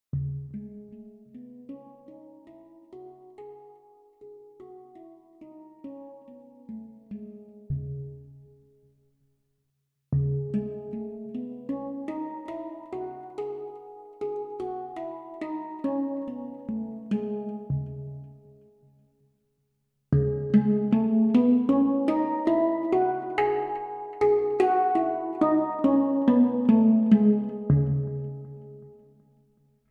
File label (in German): Anschlagstärke Tonleiter lots of reverb